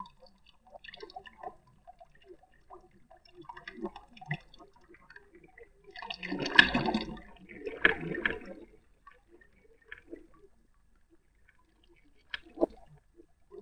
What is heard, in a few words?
Liquid Speakers; Sonic Foundry (Sony Creative Software); Ma Ja Le Ethereal Textures